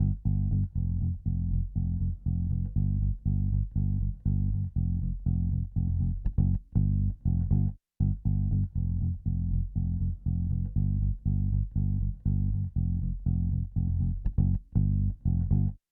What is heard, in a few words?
Bass orig